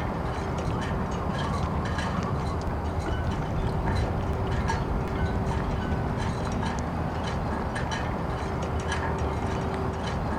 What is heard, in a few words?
desert rustle; ambient; Team Fortress 2